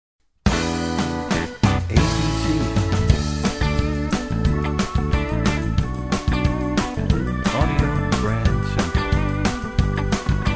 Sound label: American Country, radio jingles